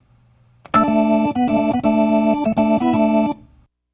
Soul Organ Riff, 70s Analog Funk

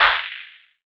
SAND, Drums; Distored Reality 1&2

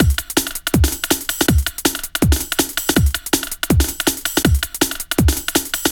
162, Loops, breaks; M8

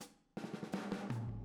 Boogaloo, Intro, Room, Drums, 82 BPM; Sonic Foundry; Drums from the Big Room The Mixes

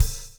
BLUES TK, Backbeat; Spectrasonics - Backbeat

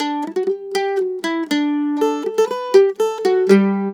MANDOL 120 G; USB Soundscan vol.42; Celtic Flavours; AKAI

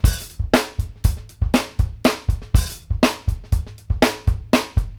tight hat, drum; Sampleheads; New York City Drumworks VOL-1

